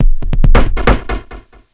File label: 138 Dance Perc; Dance DJ; Percussion